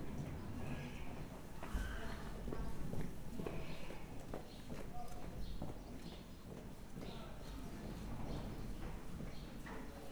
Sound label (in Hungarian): nyerítés látogatók verebek, ló; Hortobágyi Nemzeti Park 2013; máta